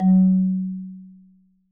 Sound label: Marimba F#2; Sampleheads - Dave Samuels Marimba & Vibes